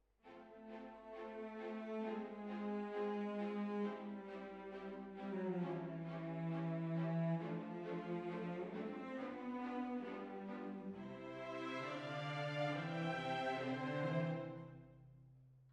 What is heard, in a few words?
Hall